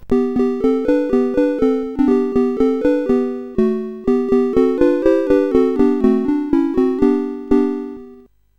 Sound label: sid, CSG8580R5; Aquantor